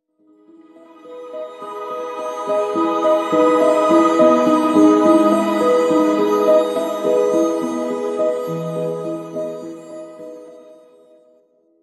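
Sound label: song of discord melody; sona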